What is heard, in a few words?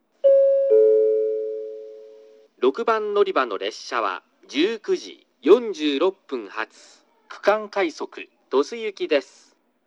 regional rapid Tosu; kokura